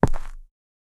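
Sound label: single high quality soldier, concrete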